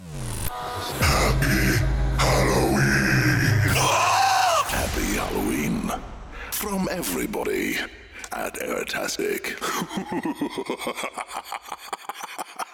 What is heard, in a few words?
Happy Halloween Long Stab, Jingles; Airtastic Radio ROI